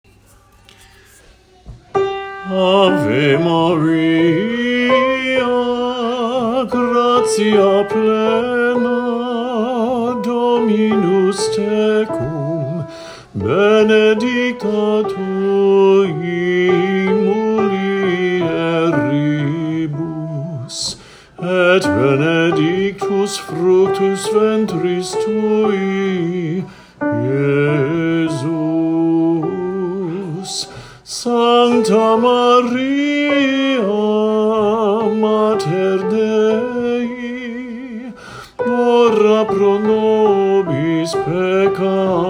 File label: Chant; Ave Maria